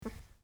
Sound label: Mhh